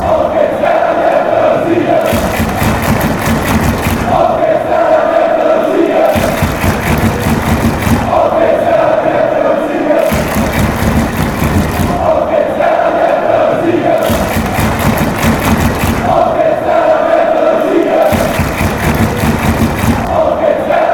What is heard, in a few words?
come on fight and win, fanchants; werder